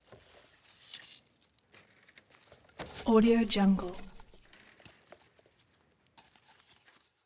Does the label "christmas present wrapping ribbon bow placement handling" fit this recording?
Yes